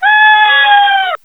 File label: fairy death; cirnogodot